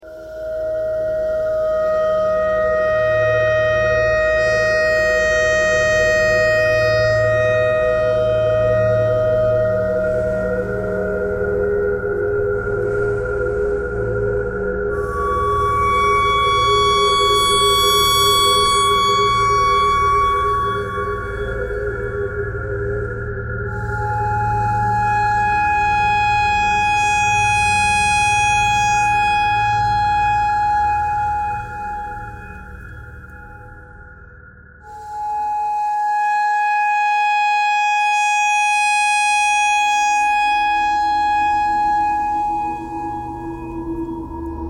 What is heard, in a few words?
clarinet notes e traffic k core birds thread pentatonic; bowedmetal